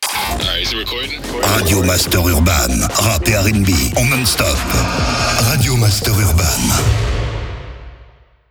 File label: JINGLE RADIO; RADIO MASTER URBAN; Habillage